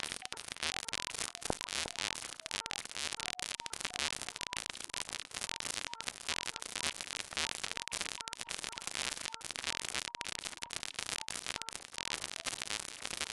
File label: rhythmic clicking; Sonniss GDC